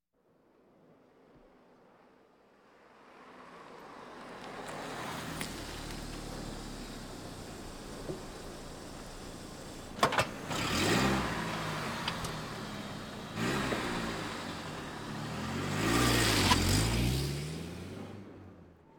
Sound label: Automobiles various